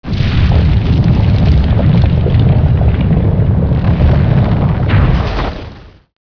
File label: temple door; aztecesc